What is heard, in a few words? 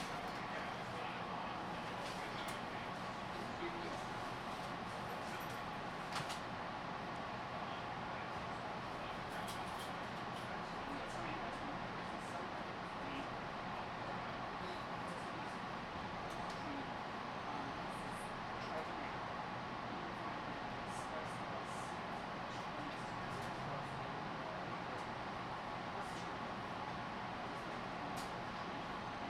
AMBISONIC INT Passenger Train in Station Passengers Enter; Metro North